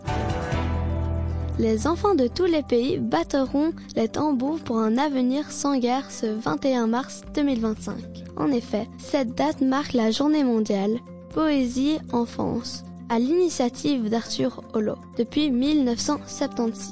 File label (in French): Tambours